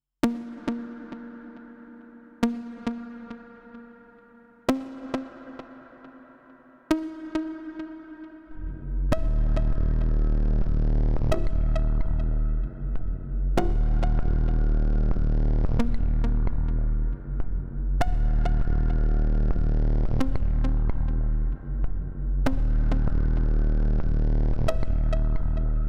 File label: menu music